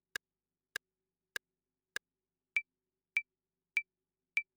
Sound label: Rim Clave